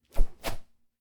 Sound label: sling attack